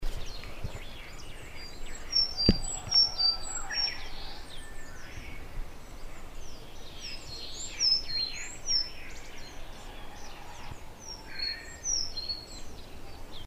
ezomushikui saezuri; エゾムシクイ; Sakhaln Leaf Warbler Phylloscopus borealoides; スズメ目ムシクイ科 旅鳥 12ｃｍ; さえずりはヒーツーキー; 夏鳥として本州中部以北及び四国に渡来する。主な生息地は亜高山帯の針葉樹林で、当地では渡りの時期に少数が観察され、都市公園等でもさえずりが聞かれることがある。

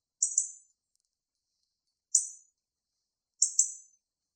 shime jinaki

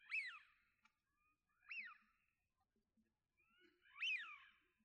hidorigamo osu nakigoe

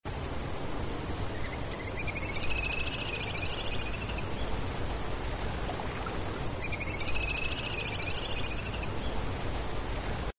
kaitsuburi nakigoe

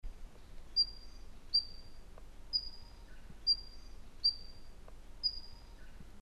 ezomushikui nakigoe; エゾムシクイ; Sakhaln Leaf Warbler Phylloscopus borealoides; スズメ目ムシクイ科 旅鳥 12ｃｍ; 地鳴きはピッ; 夏鳥として本州中部以北及び四国に渡来する。主な生息地は亜高山帯の針葉樹林で、当地では渡りの時期に少数が観察され、都市公園等でもさえずりが聞かれることがある。

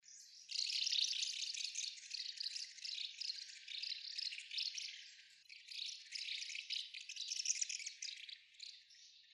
iwatsubame nakigoe

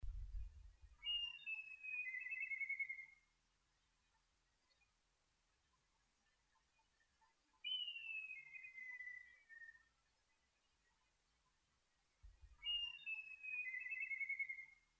tobi nakigoe

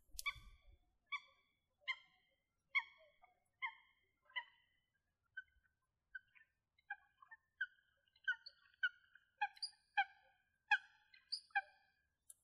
ooban nakigoe

